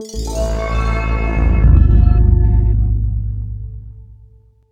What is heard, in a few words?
sting; batbarian testament of the primordials